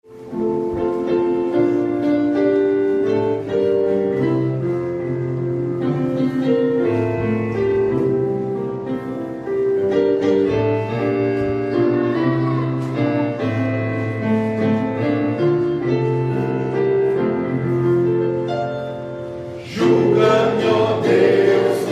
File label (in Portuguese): salmo cantado